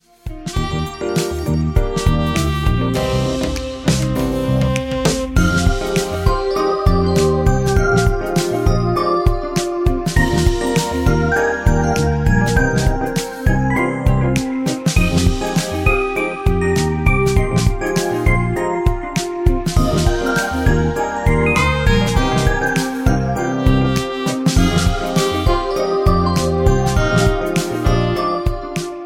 Popular Happy Snap Finger; Promoter; MIUI14

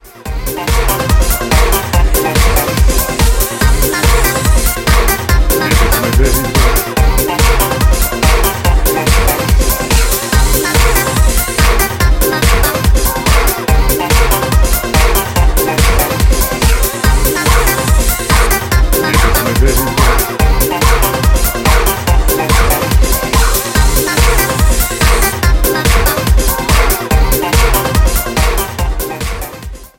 Discotheque; MIUI12

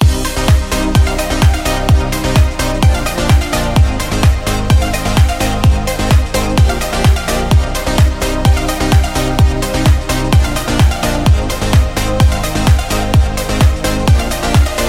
Energetic Dance Party (Loop); MIUI12

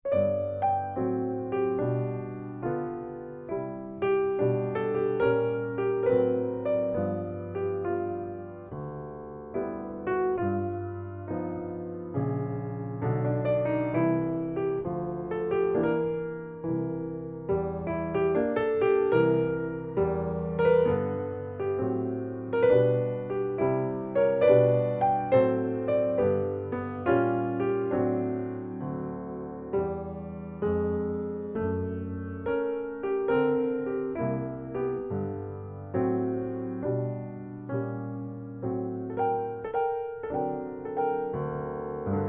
stride blues, ringtone; MIUI14 and HyperOS 1.0

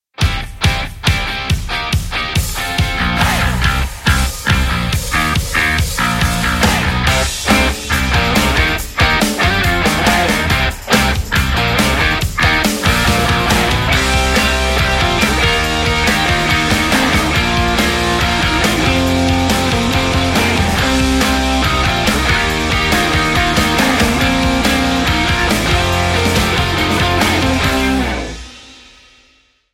Upbeat Rock; MIUI14; HyperOS 1.0

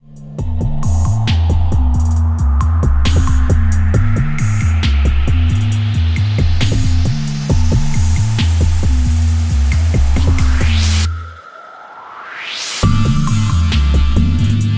Rap Dark Rhythm, ringtone; MIUI14; HyperOS 1.0

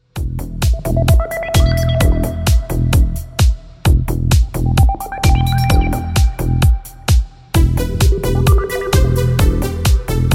Rhythmic Electronic Music; MIUI12

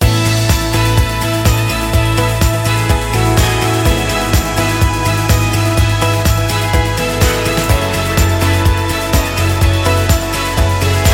Modern Uplifting Pop; MIUI12

